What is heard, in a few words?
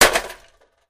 Vcr Hit Sledge Hammer; electronics